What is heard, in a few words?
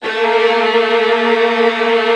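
Virtuoso Strings, violin trills, A1; ILIO; Kirk Hunter Virtuoso Strings VOL-1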